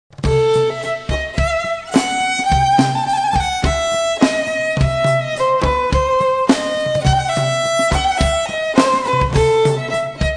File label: bublitchki melody; meiron